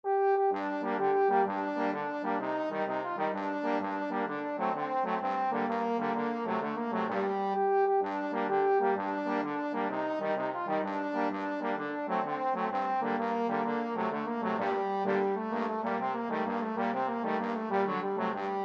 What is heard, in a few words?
trombone quartet